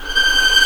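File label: G6 tremolo p violin left, violins tremolo; East West; The Ultimate String Collection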